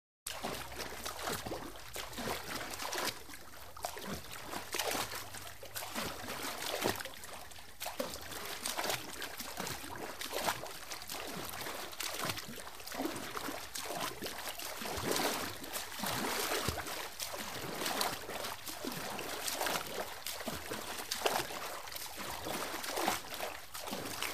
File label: Swimming; Arm Movement In Water.